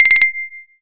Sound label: bell; SPARK-CI